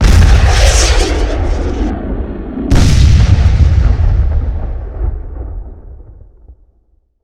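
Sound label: c4 explosion debris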